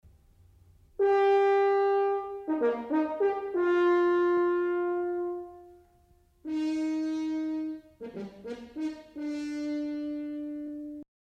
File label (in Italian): suono del corno; strumenti